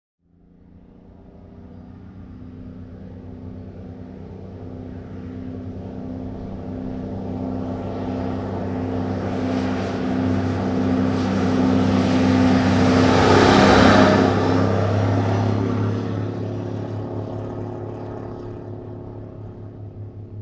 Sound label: 1954 Big Rig Ext Fast By; Period Vehicles